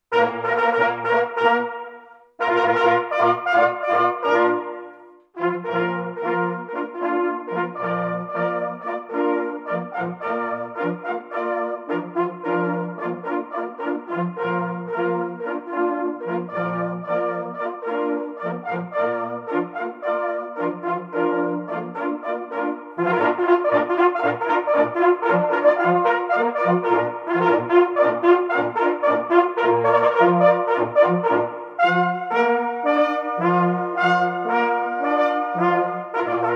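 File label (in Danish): tutti, jagthorn; fm 2012 mommark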